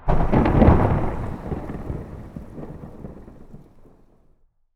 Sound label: lightning Middle; netent